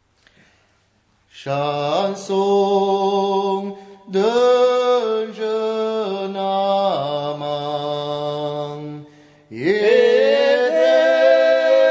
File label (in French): chanson d'un jeune amant